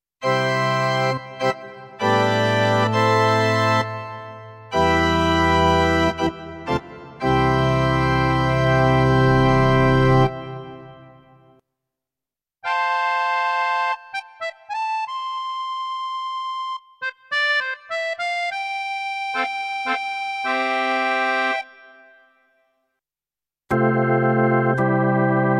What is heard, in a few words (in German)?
Orgel